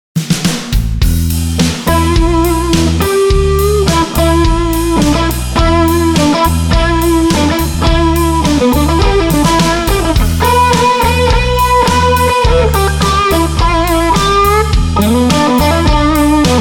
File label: 57 Mid Bridge, pickups